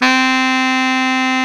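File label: SAX Baritone Sax C 4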